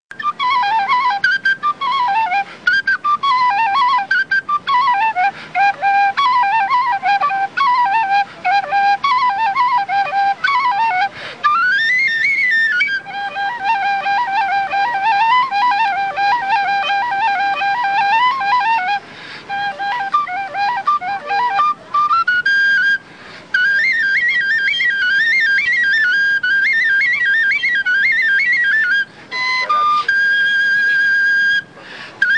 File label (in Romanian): Batuta; de la Tudora